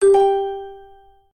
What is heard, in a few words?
collect freeround end; Lucky Joker 20; slot